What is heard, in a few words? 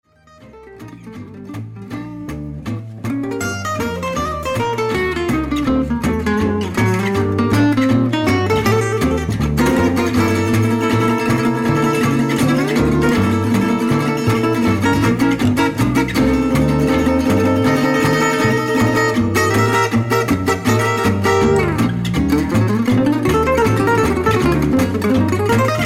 Blues Minor